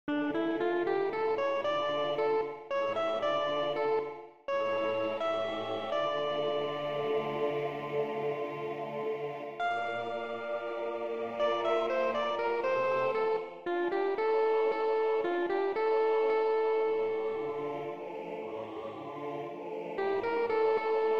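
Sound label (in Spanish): Sopranos, MIDI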